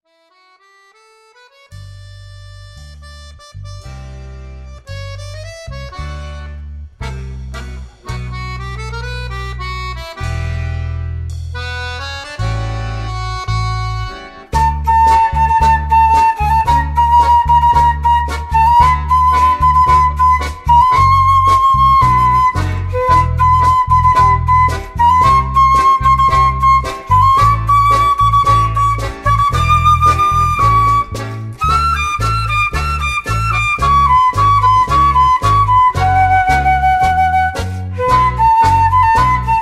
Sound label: tango, south america sensual